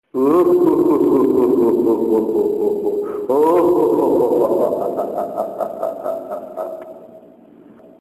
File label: halloween